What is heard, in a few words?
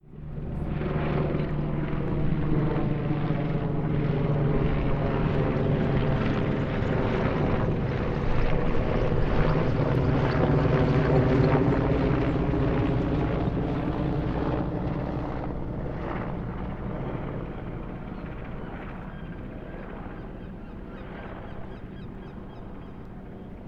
Airplane And Seagulls